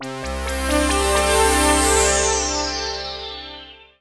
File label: Warm synth, Fanfare; 1997 MMX LAN PACK (win 95); Worms Armageddon